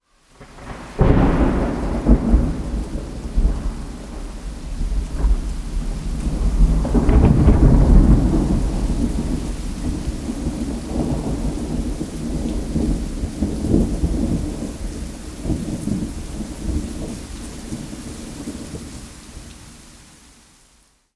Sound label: Heavy Thunderstorm With Rain